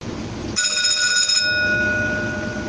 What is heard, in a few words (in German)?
DLK Klingeln